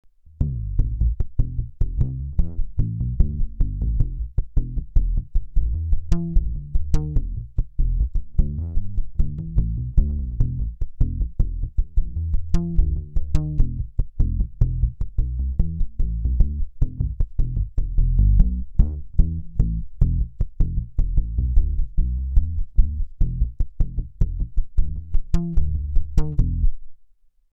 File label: Kala slap